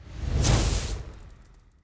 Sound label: crit